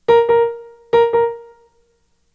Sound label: rhythm recognition